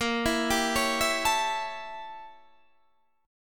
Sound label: A 7th A sharp; A7/A# arpeggiated